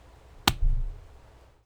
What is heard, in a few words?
Blade hit; hack